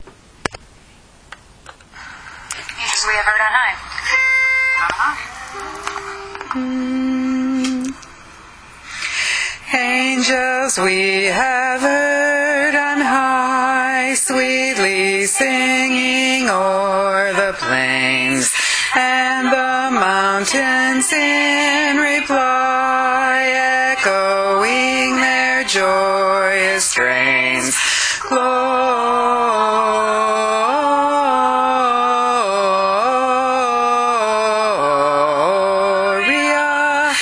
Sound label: Angels We Have Heard on High, bass